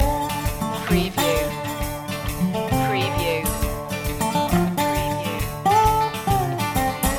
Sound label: highway blues loop